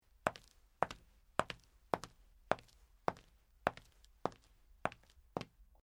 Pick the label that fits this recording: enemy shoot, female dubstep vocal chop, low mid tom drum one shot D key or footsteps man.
footsteps man